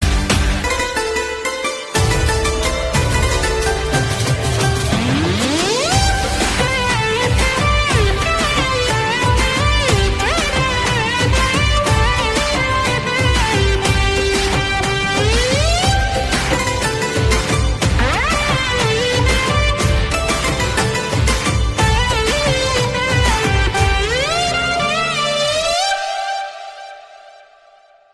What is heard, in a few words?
Ringtone